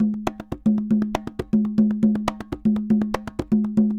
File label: CONGAS, DRUMS SAMBA; Best Service ProSamples vol.14; AKAI